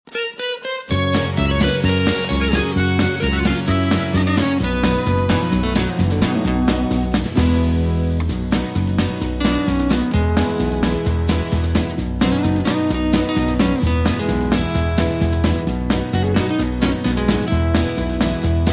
(B) no backing vocals

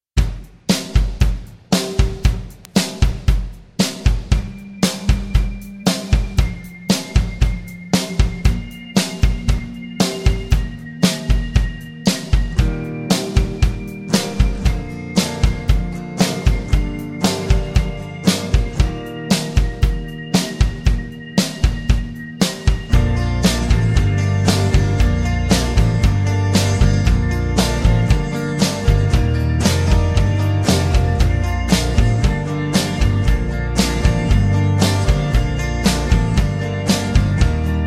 No Backing Vocals

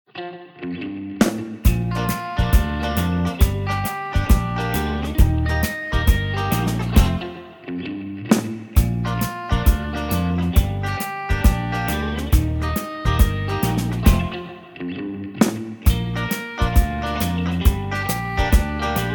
(Bb) No Backing Vocals

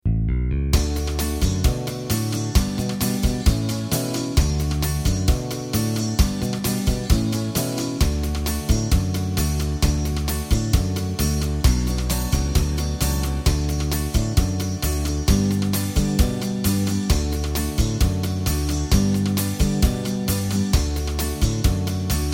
(D) No Lead Guitar

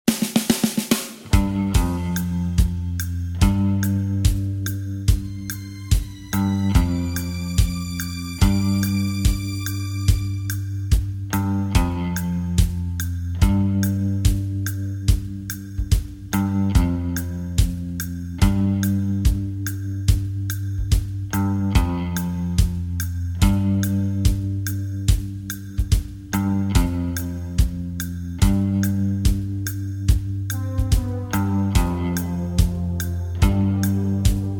(G) No Backing Vocals

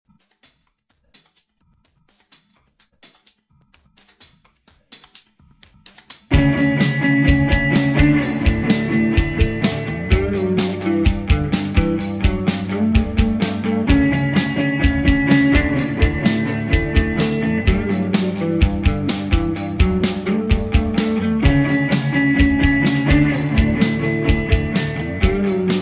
No Backing Vocals

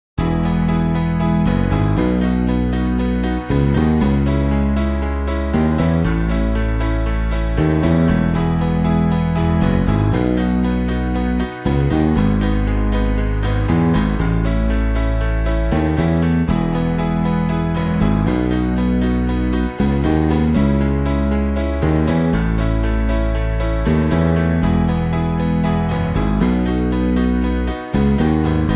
No Backing Vocals

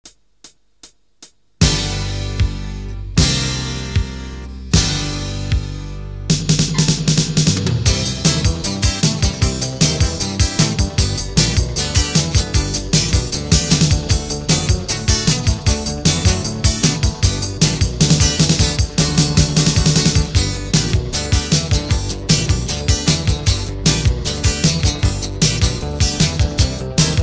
(G) no lead guitar

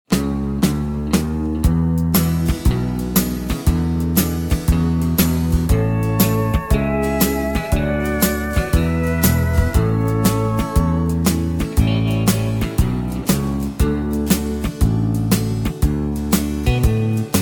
A To Bb No Backing Vocals

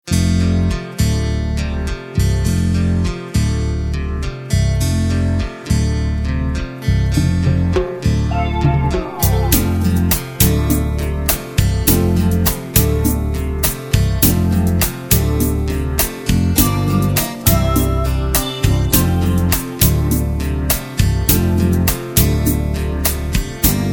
(F) No Backing Vocals

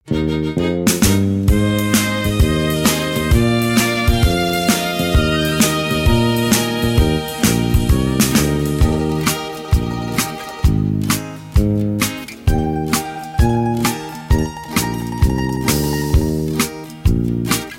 (Eb) No Backing Vocals

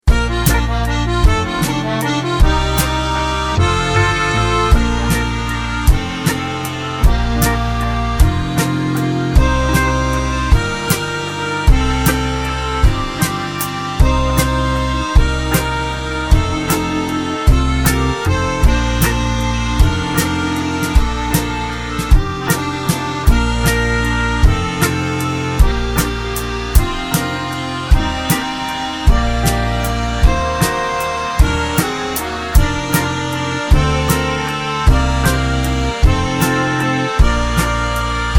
(Bb To Db) No Backing Vocals

